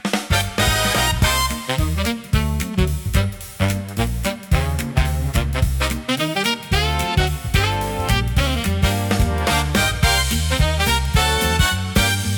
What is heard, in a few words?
Late Night Horns, big band swing